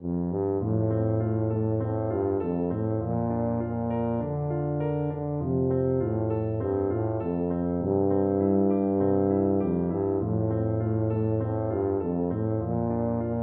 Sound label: the possums tail is bare, tuba